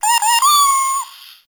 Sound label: Cat Loot Crate Meow; Warframe; Nineteeen Ninety Nine; Cat Loot Crate Common